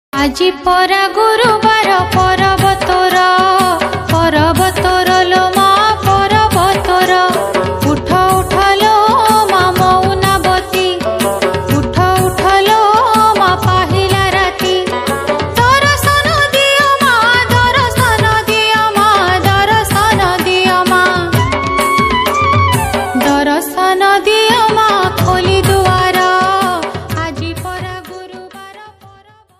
Odia Bhajan Ringtone

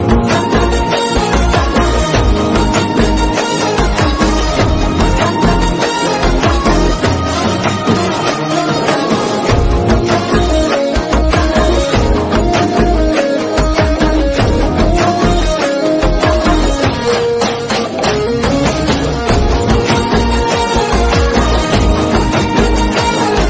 Bgm

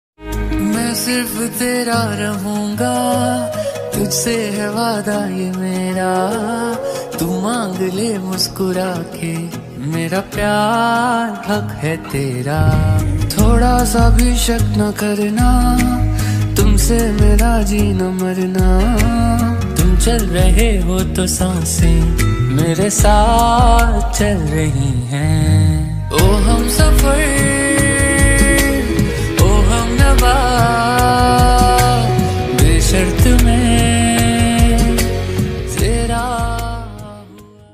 Male Version Romantic Hindi Song Ringtone

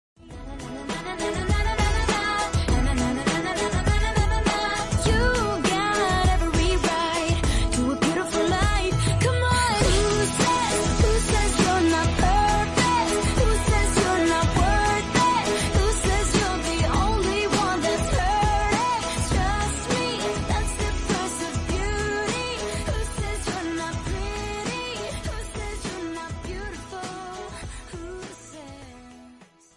English Song

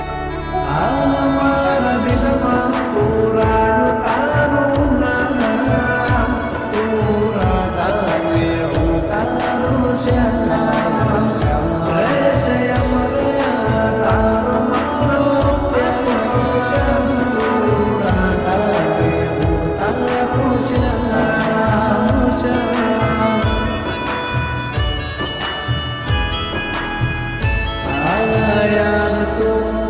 New Gujarati Song Ringtone

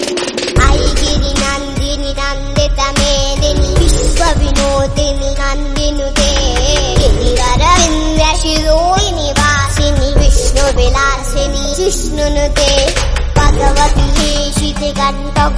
Small Girl Ringtone

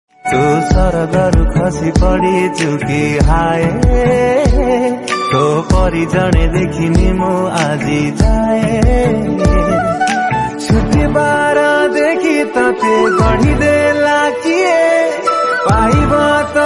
Odia Song

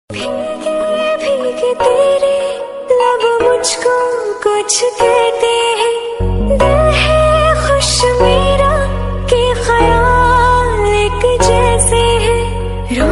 Female Version Ringtone

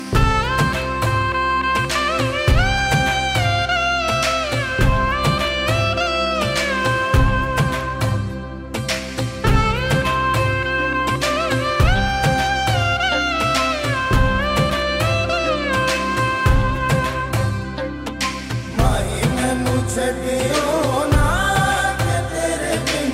Instrumental Ringtone

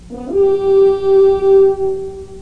Vikings Horn Ringtone; ringtones

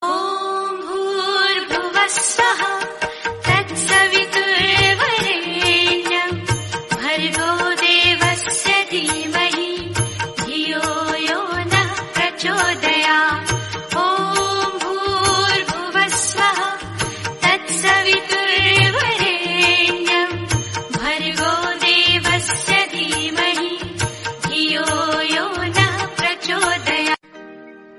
Gayatri Mantra Ringtone; Om Bhur Bhuva Swaha; Jai Maa Gayatri

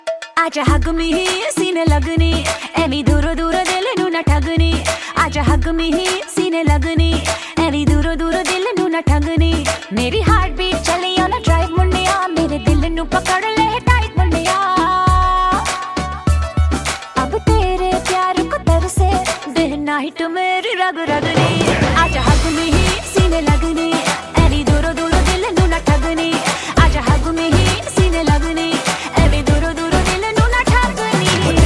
Punjabi Ringtone for Mobile Phone; ringtones

